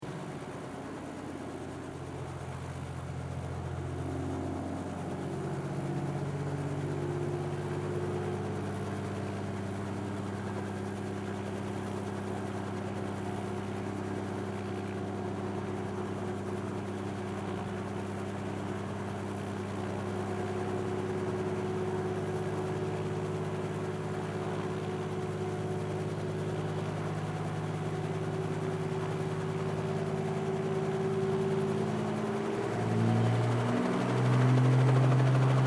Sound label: Aviation Helicopter 4 Seater Slowly Increasing Revs Take Off Fly Away